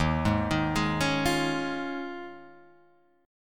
D sharp 7th Suspended 2nd Suspended 4th, Guitar, Half Step Down